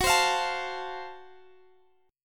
F sharp Minor Major 7th Flat 5th, Guitar Half Step Down